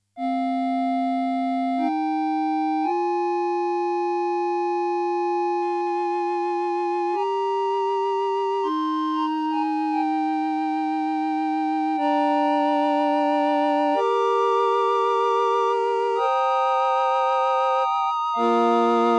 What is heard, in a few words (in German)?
Casio MT 400V Flute Vibrato mit Vibrato